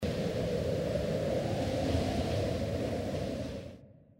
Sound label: wind